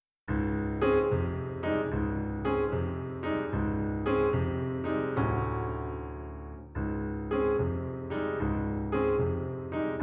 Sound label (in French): feu d artifice accompagnement